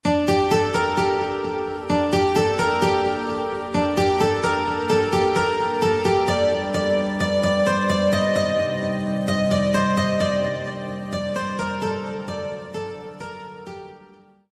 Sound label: bella ciao instrumental music ringtone